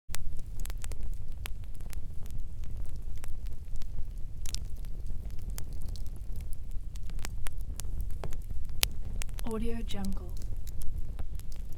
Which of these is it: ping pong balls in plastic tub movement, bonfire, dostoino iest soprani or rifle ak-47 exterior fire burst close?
bonfire